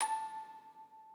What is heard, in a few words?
musicbox